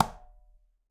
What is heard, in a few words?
Conga B Slap Mute; Latin Percussion; Drums; Drum Hits; Ableton; Factory Packs